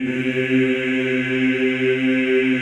CHO C2, Eeh; Eeh + Ehh

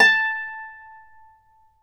Celtic Harp, Plucked Strings

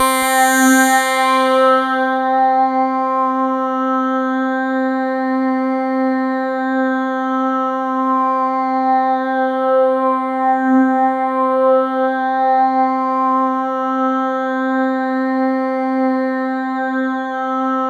C3, Roland JV 2080 TECHNO PAD

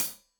Hat Tight, Hi-Hat; Deeply Sampled Drums; Cymbals Stereo

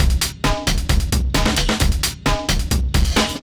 drums, 132 Fmin, Hardcore Breakbeat; Big Fish Audio; Hardcore Breakbeat Action; Warned

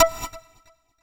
SYN FX, FX PERCUSSION; AKAI S6000 CD-ROM - Volume 5

